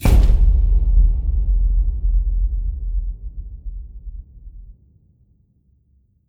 basic Mood Cueva END Bosque

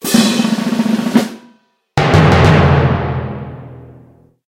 Jingle Boss Appear; Dandy Dungeon Legend of Brave Yamada

